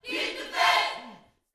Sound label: Vocal, Gospel, PHRASE; Spectrasonics